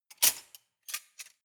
cutlery large multiple drawer grab

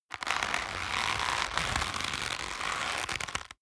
leather creak long medium

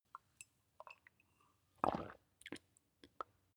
drink swallow

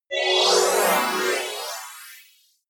appear sparkle long

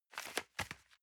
envelope swap medium

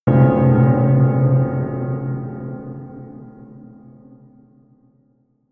melodic disharmonious bell low

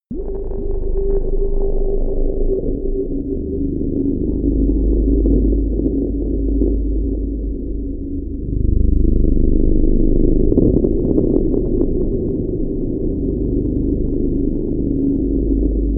crackling sonar

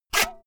saw use short fast

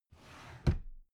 drawer cabinet carton close regular halfway

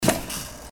machine burst

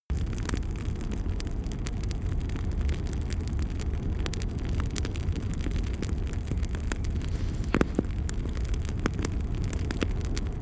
campfire medium loop

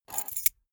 key ring small grab table hard

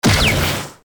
shot

